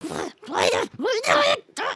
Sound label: frustrated, emotes